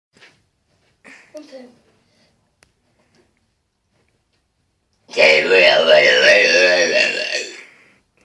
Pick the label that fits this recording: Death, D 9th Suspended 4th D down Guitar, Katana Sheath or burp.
burp